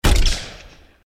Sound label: bomb; level 1